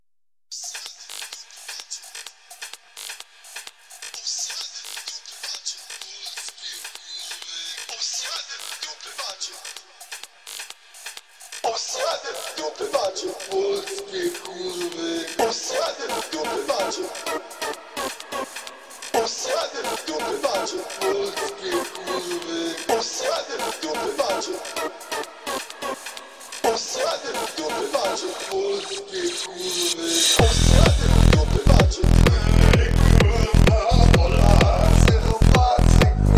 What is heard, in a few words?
Electro House